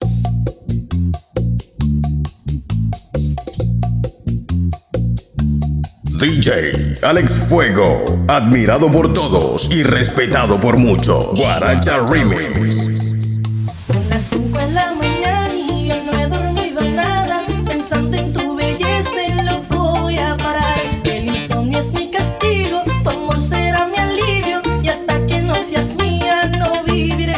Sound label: AVENTURA 134 BPM